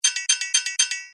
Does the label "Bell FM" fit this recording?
No